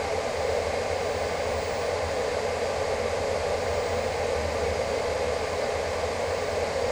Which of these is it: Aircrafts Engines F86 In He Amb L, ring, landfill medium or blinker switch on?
Aircrafts Engines F86 In He Amb L